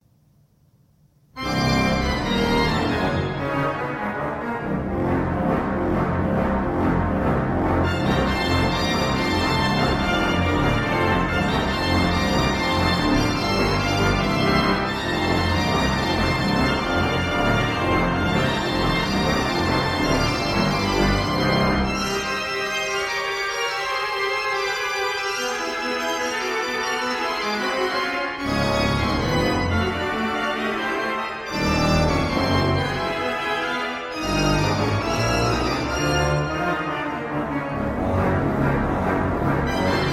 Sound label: barrel organ monkey